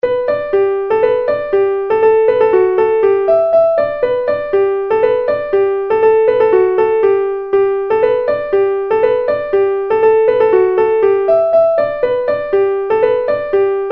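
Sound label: Murroe Polka, Traditional Irish Music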